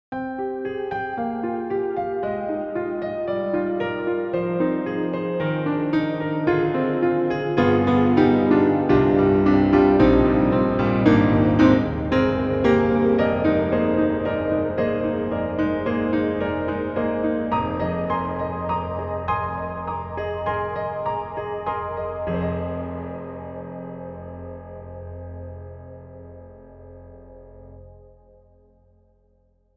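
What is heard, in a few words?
Italian Grand, piano; Synthogy; Ivory II; il Sogno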